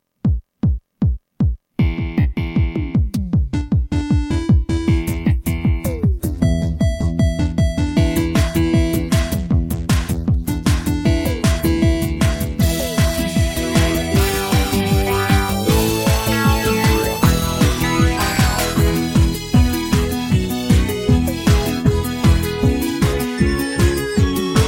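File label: backing track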